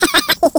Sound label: skelly small; Garry's Mod; tf2; halloween; skeletons